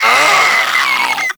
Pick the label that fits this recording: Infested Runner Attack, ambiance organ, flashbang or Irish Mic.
Infested Runner Attack